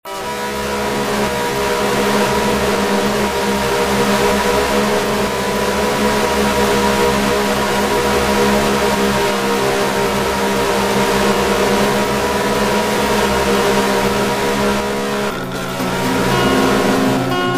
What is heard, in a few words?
Horror music